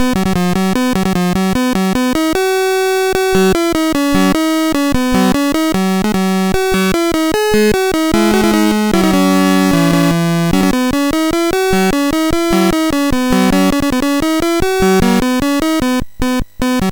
Dragons Den, MOS6581R3

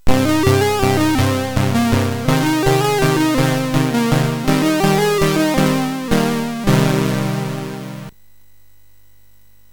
Loopz, MOS6581R3

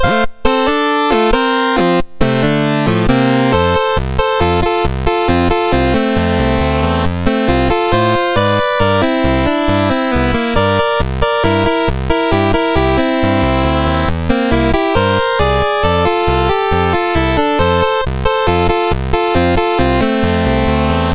MOS6581; MOS6581R2

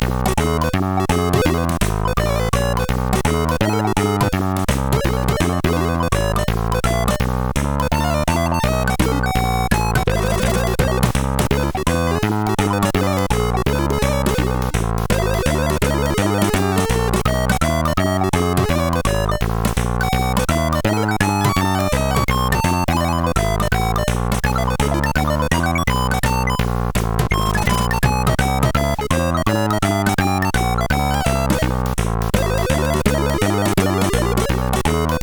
Organ Blues; sid; CSG8580R5

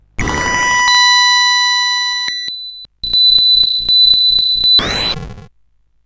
Musicbox, sid CSG8580R5

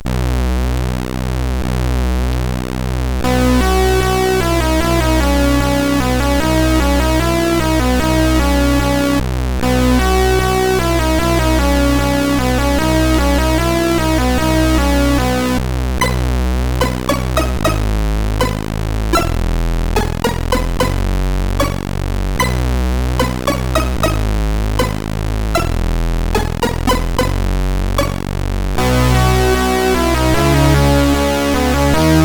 8580R5

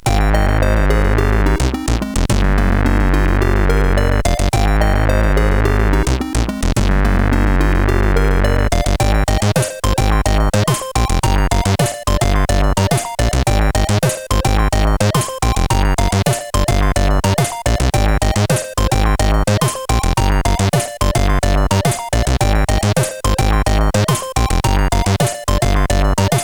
Intro Bells, sid CSG8580R5; hvsc

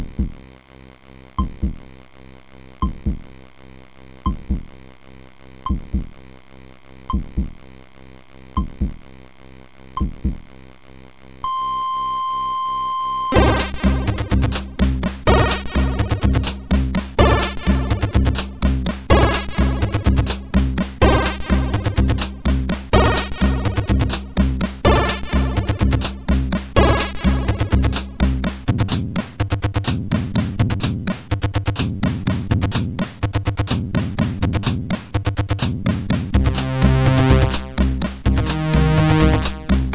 Heartbeat, MOS6581R4; hvsc

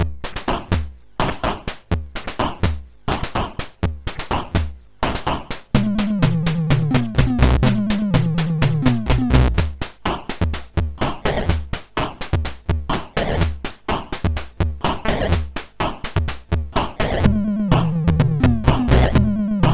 Drum, MOS6581R4

